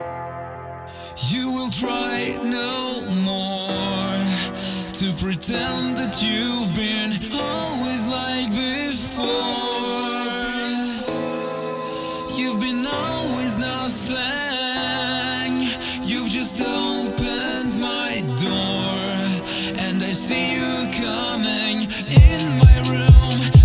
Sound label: House Grooves; House Grooves Unleashed; Ocean of Vibrations